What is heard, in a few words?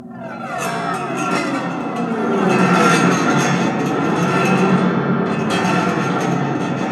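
Piano glass along strings looped; Detunized; The Keyless Piano Body; Rolfing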